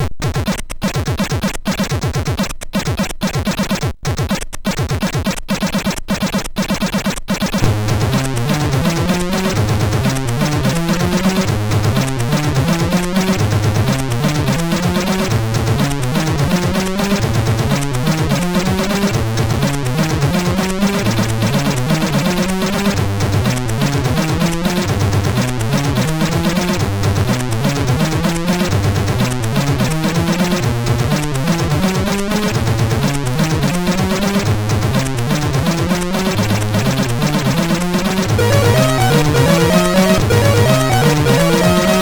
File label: Hot Rod, MOS6581R3